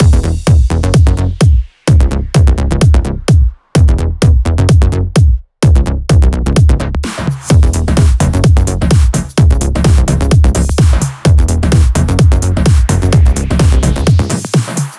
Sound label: 128BPM Mix; Vengeance Samples; Venus Kit